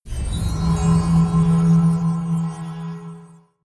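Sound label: Wing gate spawn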